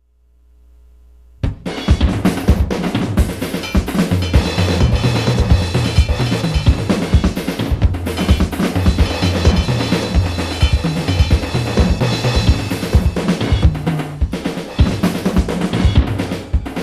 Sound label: Drum Dub